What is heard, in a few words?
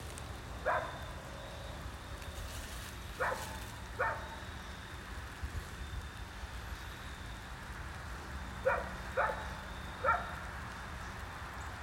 ghost Dog Barking